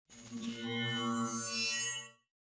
beep; planetoid

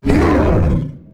attack, creatures; combat; ryuchi; CosmicRage

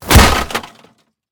metal, combat, abilities, big claw

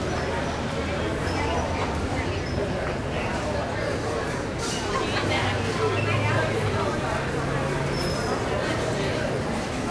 shopping, ambiances

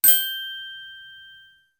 module finish, ships; Cosmic Rage; ships misc

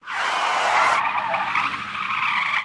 weave, highway; Cosmic Rage

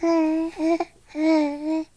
hugs, Baby; CosmicRage

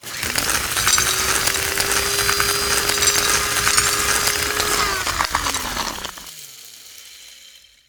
grind nothing, hand mining

